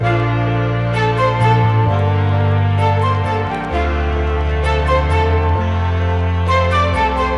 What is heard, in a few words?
Classic Melody Loop - 130 BPM D Min; Melodics; Cymatics - Eternity Sample Pack